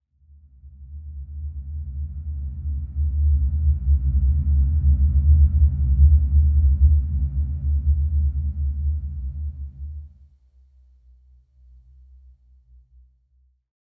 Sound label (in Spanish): Loops mutantes